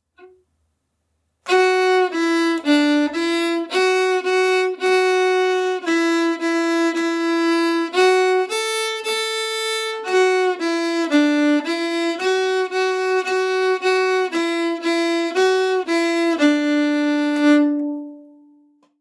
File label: basic fiddle in D; mary